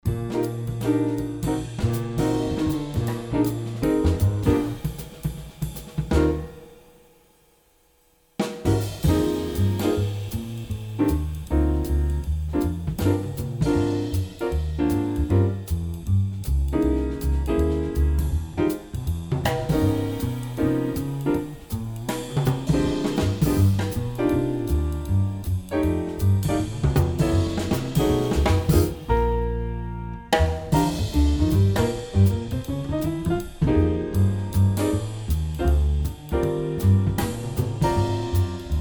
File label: Jazz Horns Play A Long